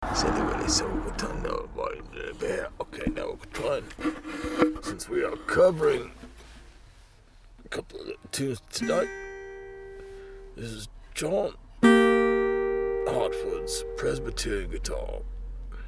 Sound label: Presbyterian Guitar